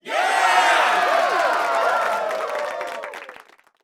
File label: masses cheer